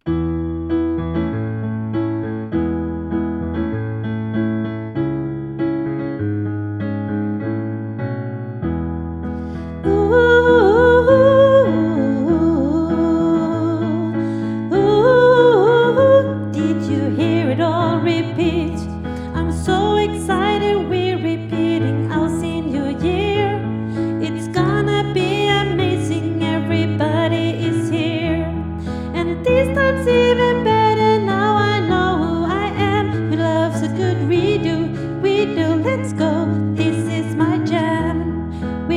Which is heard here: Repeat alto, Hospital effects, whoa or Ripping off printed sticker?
Repeat alto